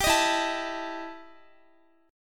E Minor Major 11th E Guitar; EmM11 strummed

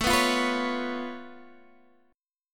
Db Augmented 7th A

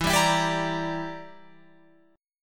E Minor 7th Flat 5th, Guitar, Open F; Em7b5; strummed

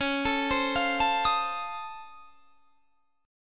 C sharp Augmented Major 9th down, guitar chord; C#+M9; arpeggiated

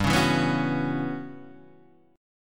E Minor 6th Add 9th G chord

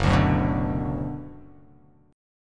Gb Suspended 4th Sharp 5th; strummed; JGuitar

